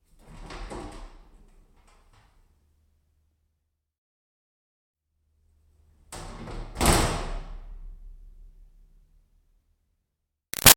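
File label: Door Metal Milking Vat Open Close; Metal Doors; Hollow; BigRoomSound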